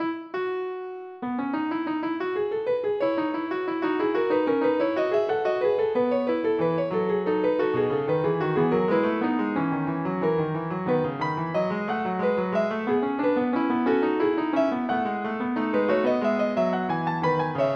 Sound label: fugue